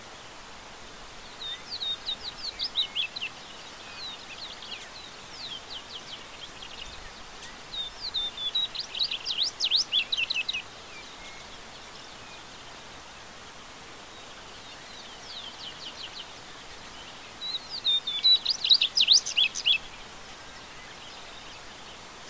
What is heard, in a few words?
Tropeiro seedeater, پرنده دانه خوار تروپیرو